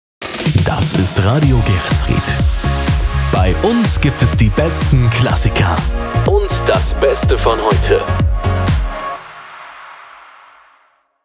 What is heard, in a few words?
Jingles; Radio Geretsried; Fruit Punch Lite